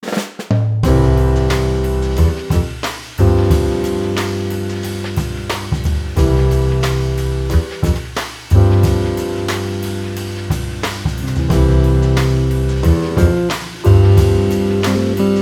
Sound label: Relax Chillout Jazz